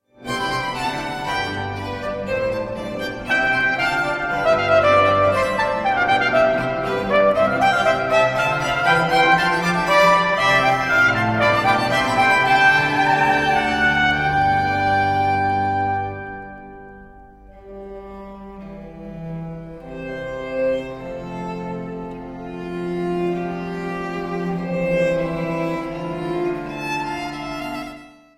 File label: sonata for 6 trumpets 2 violins 2 violas and bass; Heinrich Ignaz Franz von Biber